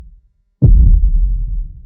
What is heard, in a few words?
epic result